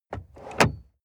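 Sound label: sports car interior glove compartment open soft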